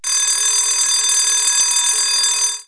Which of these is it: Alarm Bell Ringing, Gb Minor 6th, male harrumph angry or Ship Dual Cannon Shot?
Alarm Bell Ringing